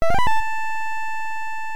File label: note fx; seagaia; ld22